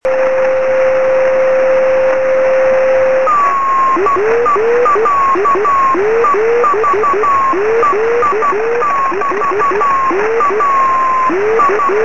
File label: beacon 23cm; pi7qhn